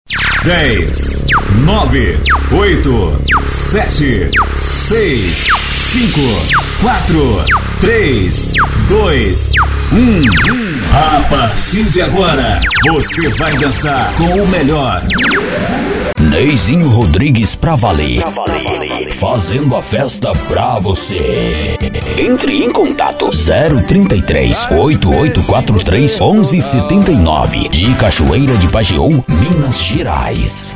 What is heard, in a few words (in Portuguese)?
abertura forro